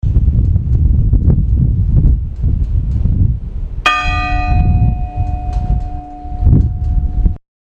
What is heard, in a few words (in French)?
cloche; Romans, cloche 1